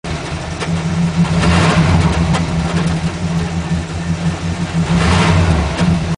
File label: v8 oldsmobile